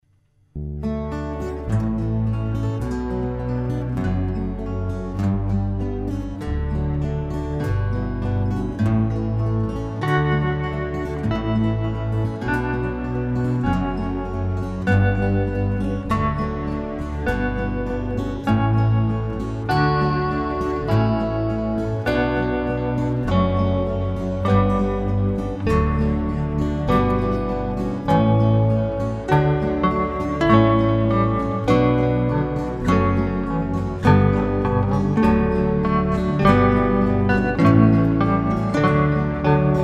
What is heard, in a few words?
Bell